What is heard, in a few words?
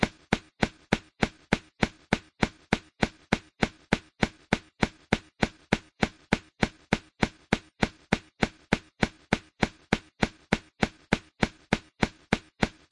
steps; sokoban warehouse